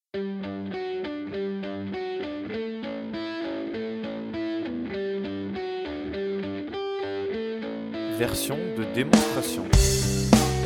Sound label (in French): batterie